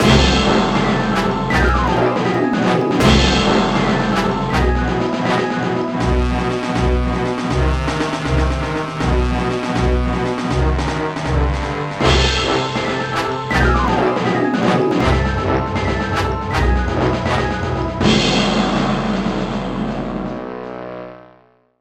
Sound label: Menu; Back in the Barnyard Slop Bucket Games 2008 NDS gamerip